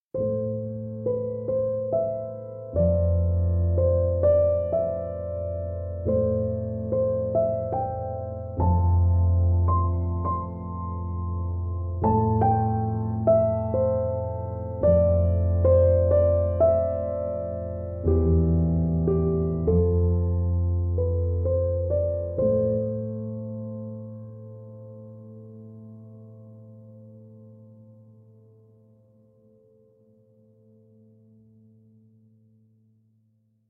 Piano Interlude; Lakadbaggha

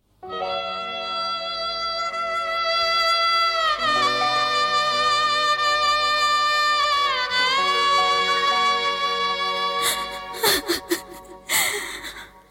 (Sad); Maqsad

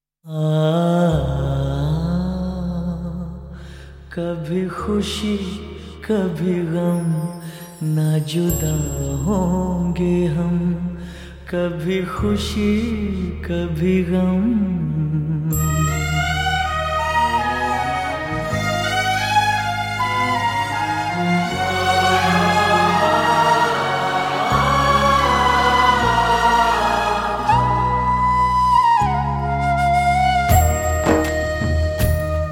Sad Version

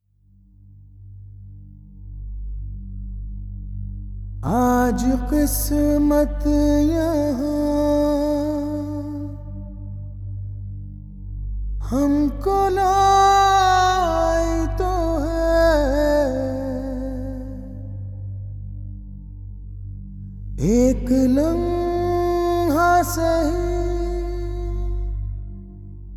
(Sad); Aashayein

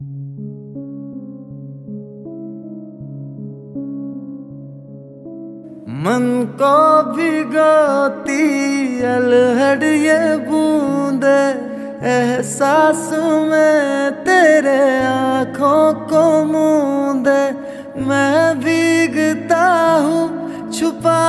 Unplugged

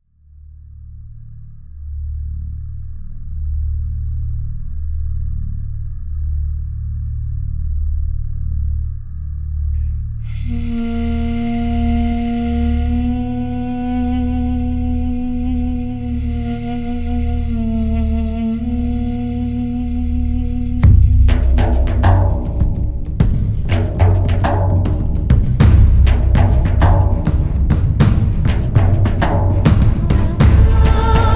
Instrumental Version

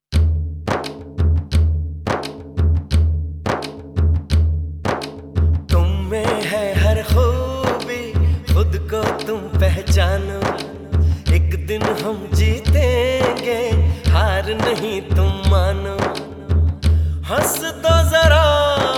Happy

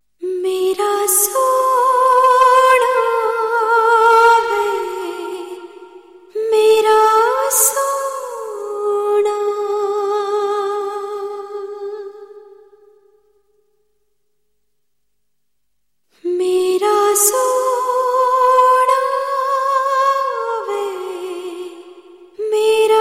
Mubarakaan (Sad)

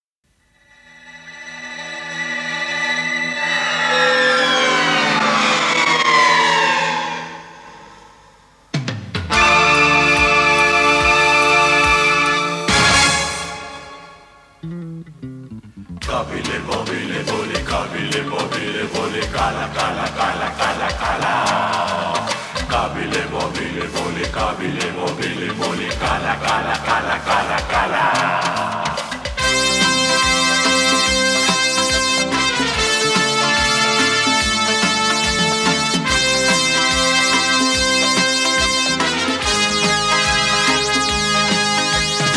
Music

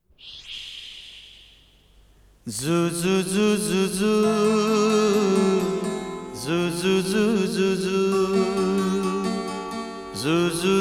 Male; Sanjog

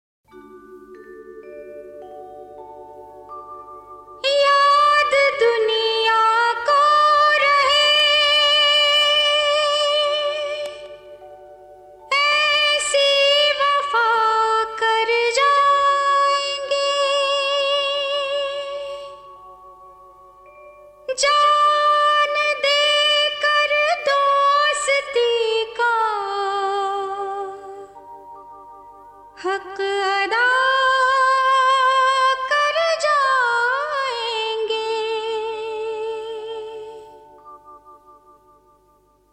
(Sad); Geeta Ki Saugand

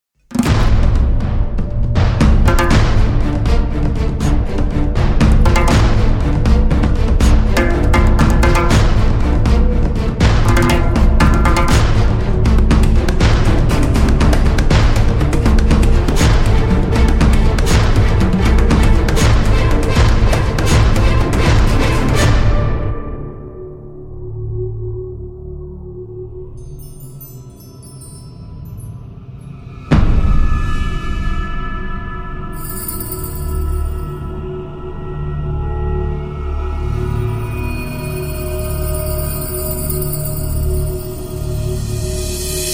The Mirage And The Tornado (Instrumental Version)

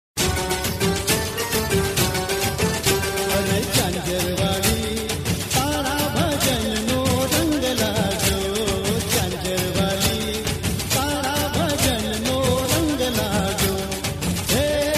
Gujarati Garba

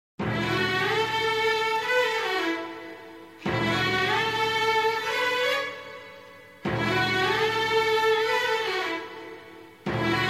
(Sad); Waaris

